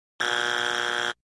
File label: buzzer; family fued